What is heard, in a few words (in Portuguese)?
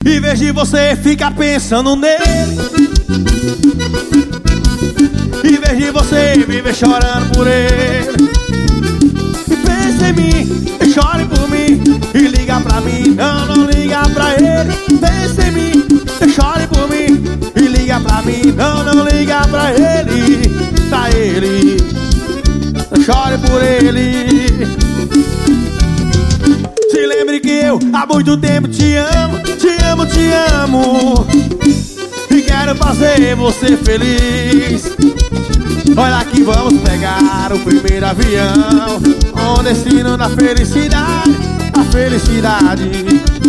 forrozao ao vivo recife